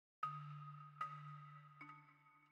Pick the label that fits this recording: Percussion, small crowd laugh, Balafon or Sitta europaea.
Balafon